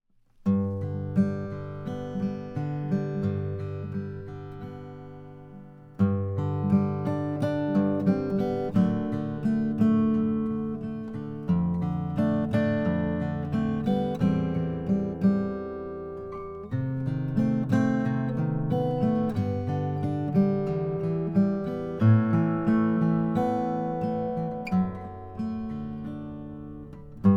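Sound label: froggy bottom brazilian; 50th anniversary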